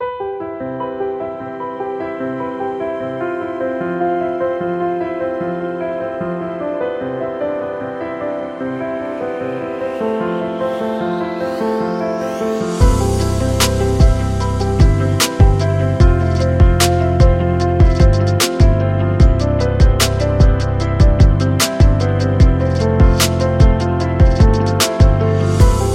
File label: Mellow